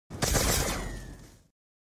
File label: gun sound; mark ii